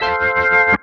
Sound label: ORGN 123, Keys; Houseworx